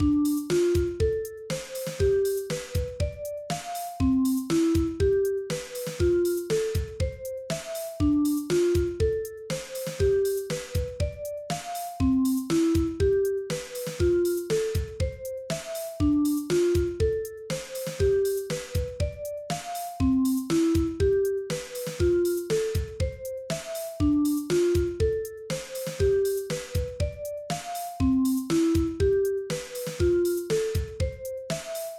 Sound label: Dm7 G7 Cmaj7 Fmaj7 Vibraphone; 1beat